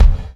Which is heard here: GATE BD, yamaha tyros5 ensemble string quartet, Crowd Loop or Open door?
GATE BD